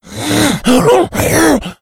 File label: shoved, infected rage; npc; szf